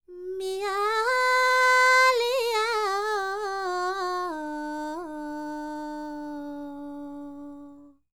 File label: World Voices, INDIA; ILIO; Vocal Planet